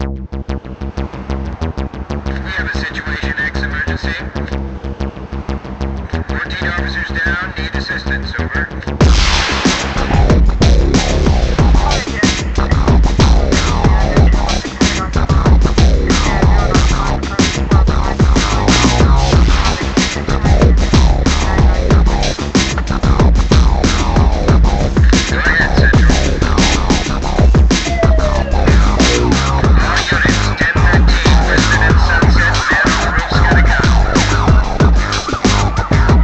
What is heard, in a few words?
BGM Menu Tune; Future Cop L.A.P.D; Soukou Kidoutai L.A.P.D.; playstation